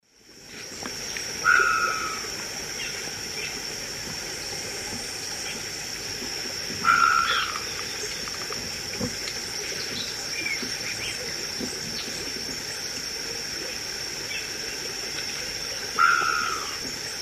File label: Cacicus solitarius, calls; S. America; Eastern Lowlands